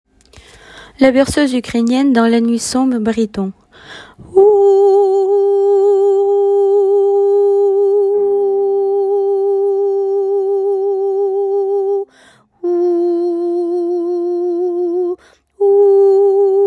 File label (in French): baryton